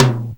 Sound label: tr 505 synth tom sample a sharp key